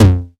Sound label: 909 TOMS; Wizoo - Platinum 24 Electronic